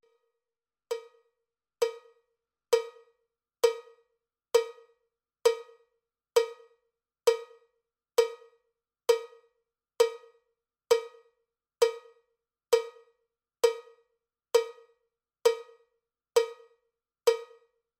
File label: cowbell click track bpm 79; cowbell click track bpm 66